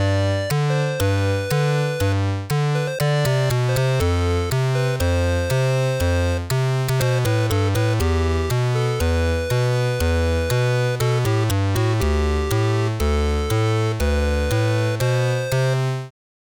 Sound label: C64 Melody